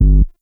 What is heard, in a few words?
Hip Hop Pack; Warframe; Bard; Bard Instrument Packs